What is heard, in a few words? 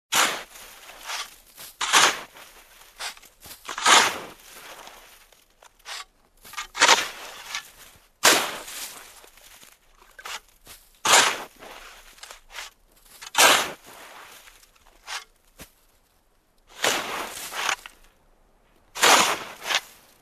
Shoveling snow with a shovel